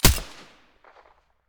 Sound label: mdr silencer stereo, weapons